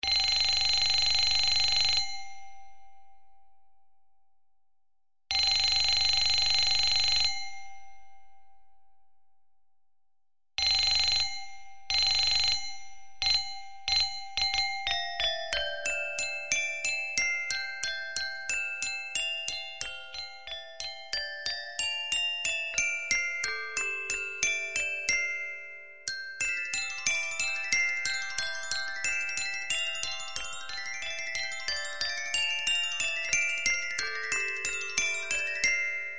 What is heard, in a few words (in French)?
Alarme sonore